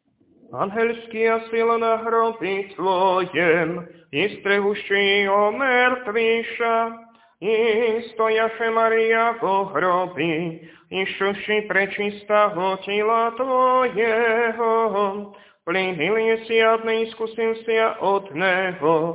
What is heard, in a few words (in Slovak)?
Tropár voskresný hlas 6; nedelna-skola